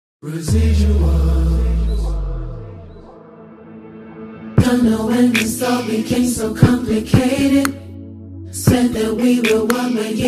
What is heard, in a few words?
Choir cover